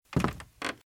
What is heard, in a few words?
Footsteps - Wood - Creaky - Walk - Right